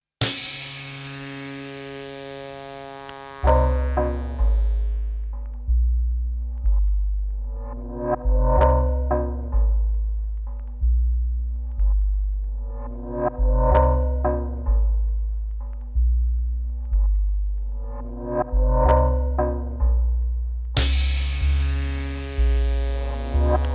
deep gong

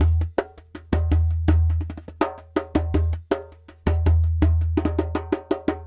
Lg Dumbek

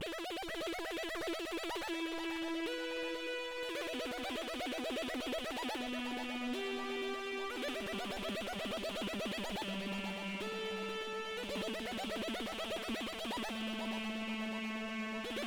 melody synth strange

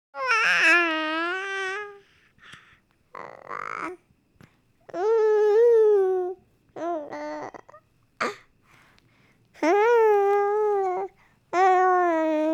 baby coo; Asian Show Sound Effects

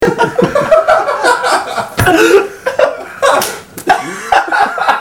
laugh

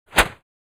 swish single; crashes swishes impacts; Asian Show Sound Effects

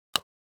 bone break; fights bodyfalls; Asian Show Sound Effects

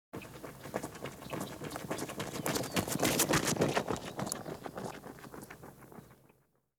one person running; Soccer; Butterfinger Video Sound Effects; Soccer Running Chainlink and Huh

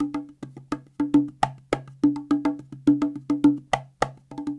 Cahon

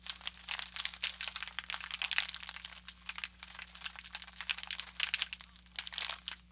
Cheetos med; kontakt

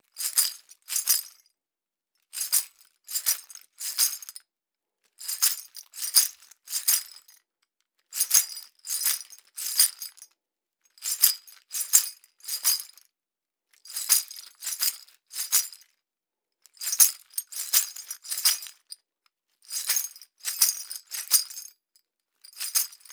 Metal shaker; Crow Hop (Weemmarategwa)

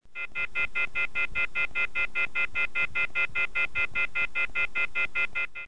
off the hook

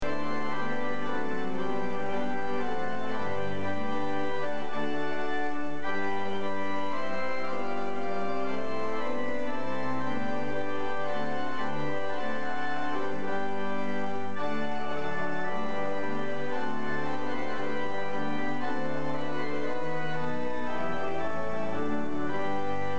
organ

